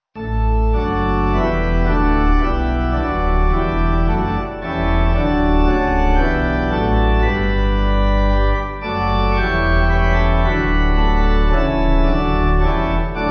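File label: pipe organ, Music Backing Files for Churches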